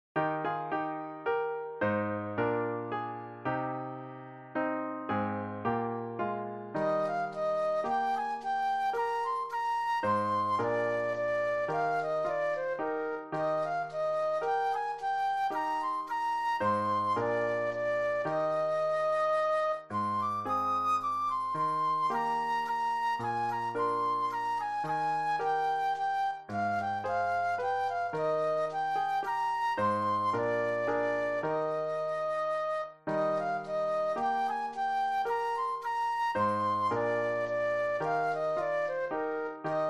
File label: Flute Solo; christmas